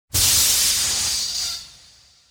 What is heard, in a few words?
gas; activities planetoid